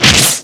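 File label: battle sword; Etrian Odyssey I HD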